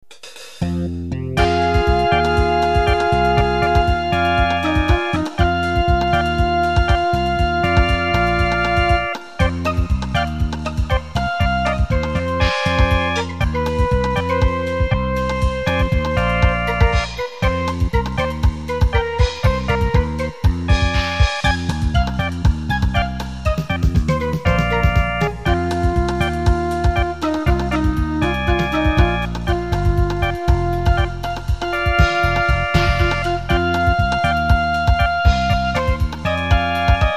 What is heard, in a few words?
Midi